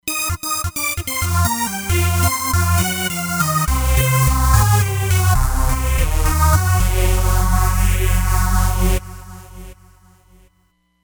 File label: Retro, Roland Fantom XR; Alfa Retro